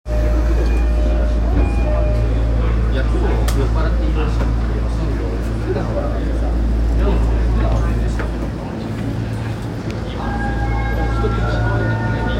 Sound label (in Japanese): 丸ノ内線新宿東